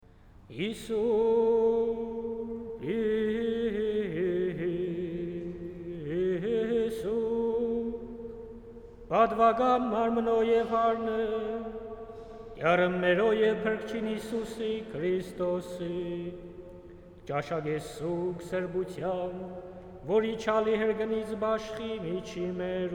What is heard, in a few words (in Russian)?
Армянская месса; Святого святого честного Тела и Крови